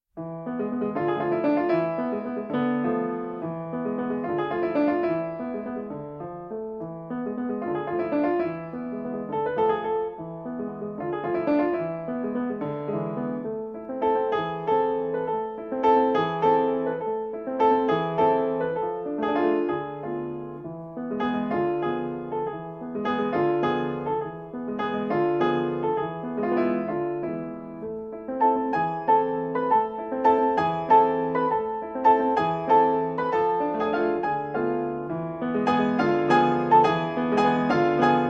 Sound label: Festive Folk Song